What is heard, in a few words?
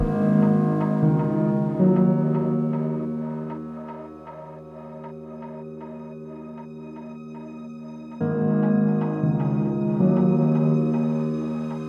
(Instrumental Version), Deep House